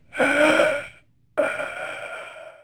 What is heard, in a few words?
monster, combat